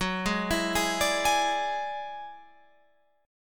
F sharp 7th Suspended 2nd Sharp 5th, Guitar, Open F; F#7sus2#5 arpeggiated